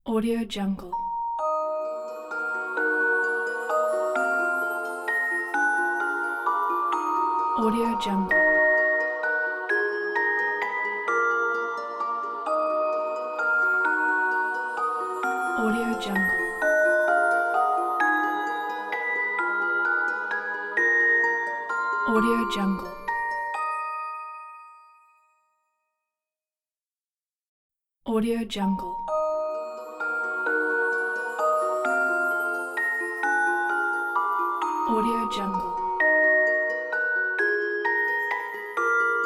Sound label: magic fantasy logo; turpak